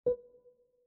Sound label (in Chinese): 点击音效